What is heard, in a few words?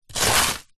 stick scrape line deep dirt; zapsplat; foley